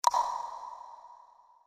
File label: Tongue Large Empty Chamber